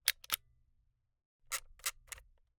Camera, Kinusa K77, 50mm lens, plastic, snapshot, wind film; Eiravaein Works; Vaeyan IV